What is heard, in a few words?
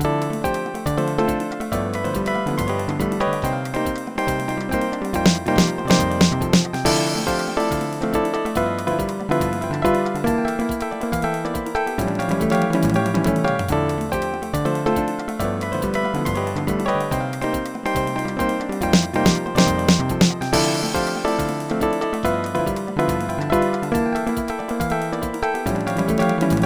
solo; Dr. T's MIDI Recording Studio